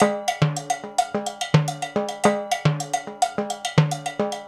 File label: African Contemporary, Mozambique; Spectrasonics; Supreme Beats